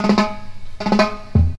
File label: FILL BORED 153, drum; BREAKS